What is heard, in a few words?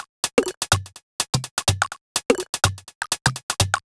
Top C 125bpm, House Drumloops; 90 s Sample CDs; Zero-G House Fabrik Drumloops; ZG Drumloops